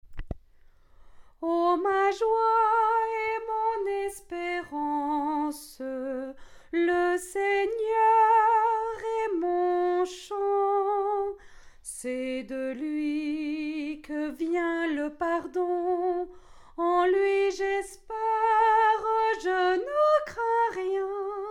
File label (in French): SOPRANO, atelier de chant a plusieurs voix